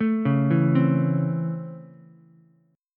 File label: C sharp Minor Major 7th Sharp 5th C sharp Ukulele; C#mM7#5 arpeggiated; 2,1,0,3; JGuitar